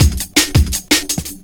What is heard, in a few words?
Break, Loops; M8